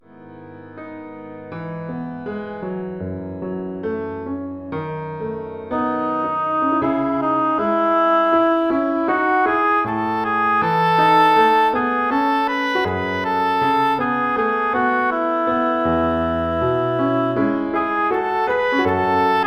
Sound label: oboe solo